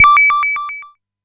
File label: Amdek Perc; techno drum fx; Analogue DrumHits